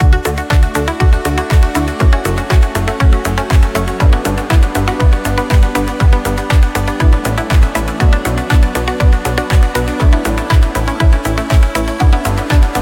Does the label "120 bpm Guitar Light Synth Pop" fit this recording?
Yes